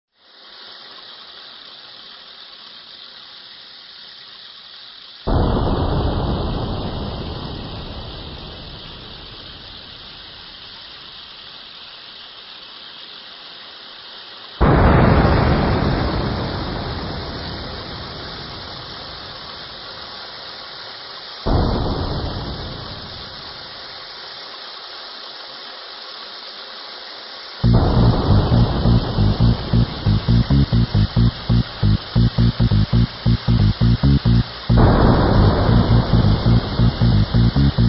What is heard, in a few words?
Rain